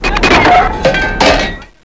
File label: shell